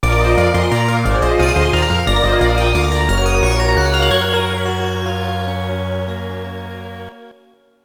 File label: gameover; Salamander Deluxe Pack Plus; Saturn gamerip 1997